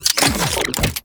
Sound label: Briefcase Launcher Idle Fidget; Executive; Warframe; Weapons; Corpus